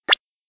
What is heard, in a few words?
bleep